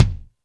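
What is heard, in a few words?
kick Solid, Alesis D4 drum machine; 300 Drum Machines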